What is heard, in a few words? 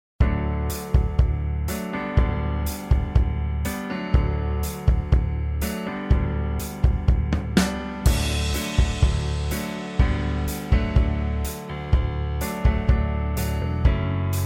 (D) No Backing Vocals